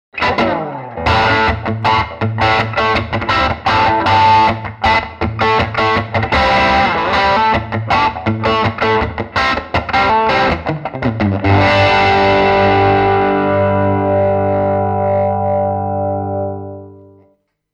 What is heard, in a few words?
Crunch Light US